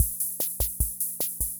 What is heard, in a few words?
Kent K-220 Rhythm System Loop, drum machine; Kent K-220 Rhythm System Sample Pack; 300 Drum Machines